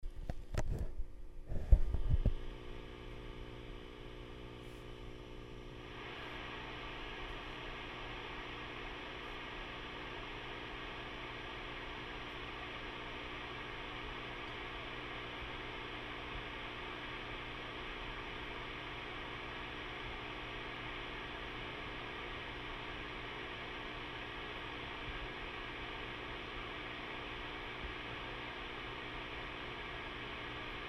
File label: egnater rebel 30 gain